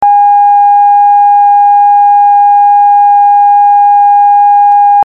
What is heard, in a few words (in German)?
Sinus 816 Hz von Kassette; Kassettenaufnahme des 816-Hz-Tons; Der Ton hat auch Schwankungen in der Lautstärke und hört sich rau an; in 8 Bit digitalisierten Schwingung